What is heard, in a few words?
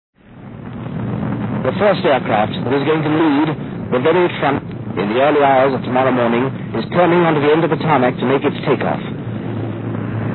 aircraft